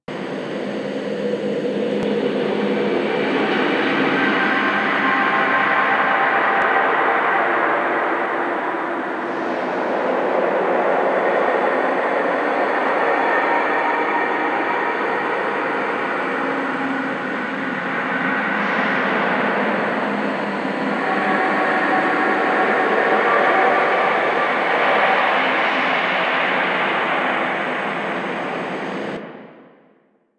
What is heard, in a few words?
Galleon Interior Amb